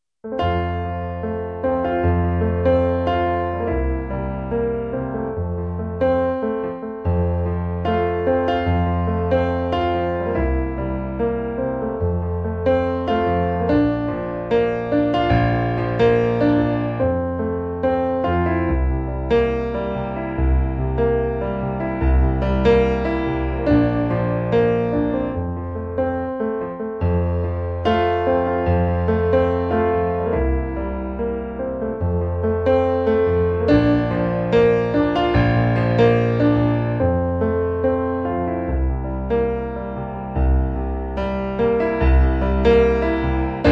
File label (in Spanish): PIANO